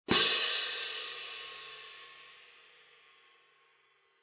18 Artisan Traditional Symphonic Medium Heavy, Sabian 18" Artisan Traditional Symphonic Medium Heavy Cymbals; Loud Crash; hand cymbals